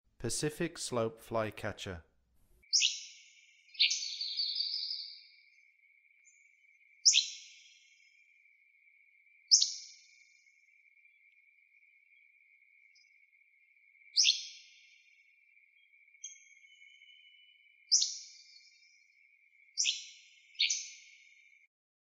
Pacific slope Flycatcher, Songs of Birds of North America